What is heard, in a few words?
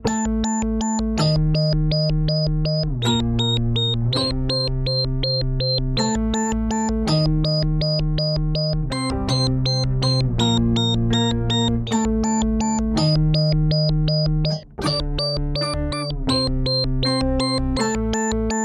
Boss SY 1 SFX